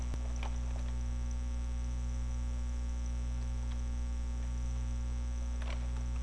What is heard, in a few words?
hammer